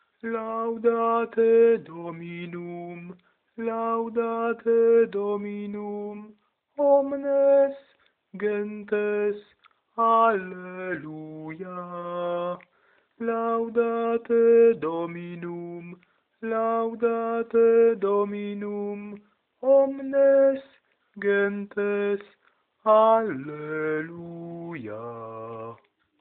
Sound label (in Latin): Laudate Dominum bas